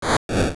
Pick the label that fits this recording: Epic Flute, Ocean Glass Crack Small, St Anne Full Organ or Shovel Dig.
Shovel Dig